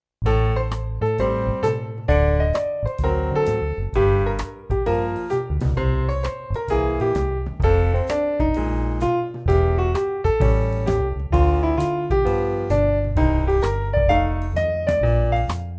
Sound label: II V Modulation, piano; Jazz